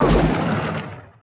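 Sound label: laser gun; Total Control - Action Cutz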